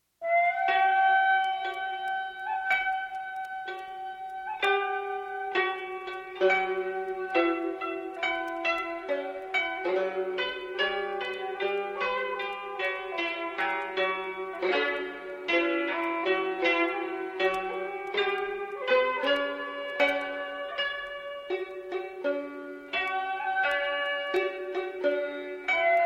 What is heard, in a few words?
Sankyoku Chamber Music